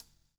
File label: COPS STK; Spectrasonics - Backbeat